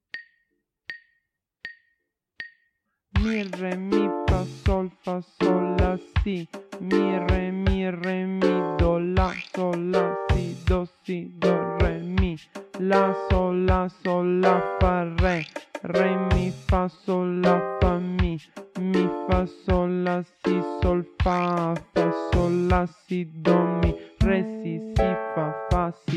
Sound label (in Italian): solfeggio